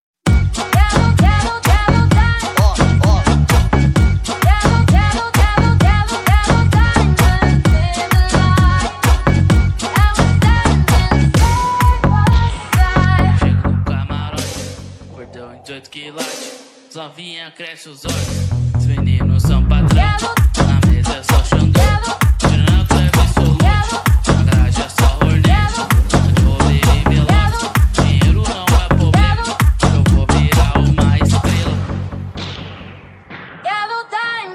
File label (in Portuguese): pancadao funk